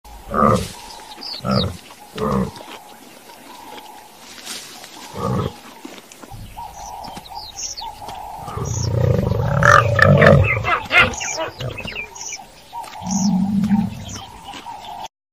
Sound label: Giraffe